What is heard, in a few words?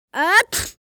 Young boy sneezing